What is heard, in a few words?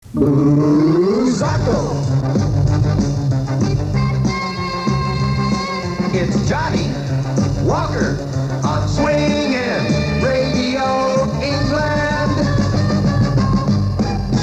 jingle; Radio England; Johnnie Walker